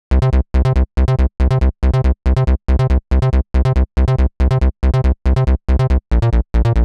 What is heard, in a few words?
140 House Bass Cmin; Dance DJ; Digidesign; Pro Tools; Pro Tools Essential Loops